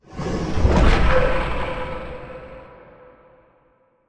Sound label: Giant Pillar Distant Stop; Titanfall 2; Boomtown